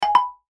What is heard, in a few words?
chime musical mallets soft; Chimes; Blastwave